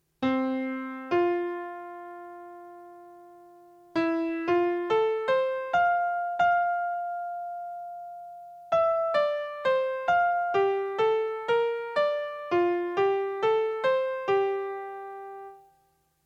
"melody" sequence; corresponding to performance 2

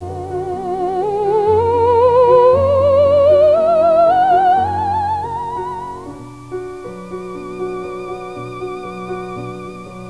Fragment of Theremin.

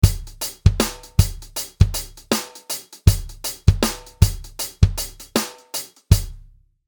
shuffle example drummer

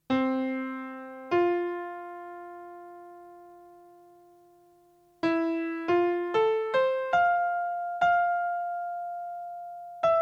"melody" sequence; beginning of Schumann's Träumerei; Rhythm in music performance and perceived structure; Amandine Penel and Carolyn Drake

